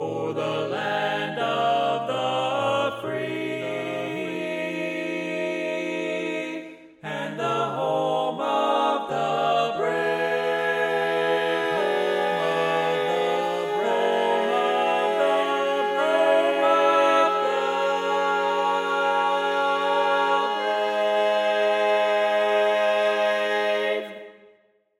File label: Tenor